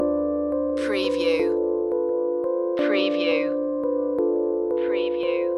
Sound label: the peak keyboard loop